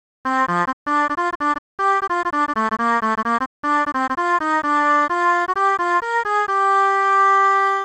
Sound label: 3x Osc